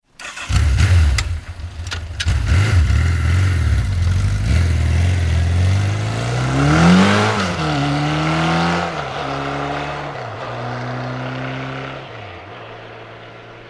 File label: Motorbike Suzuki 1100 Start and Away; Motorcycle; Tom Hutchings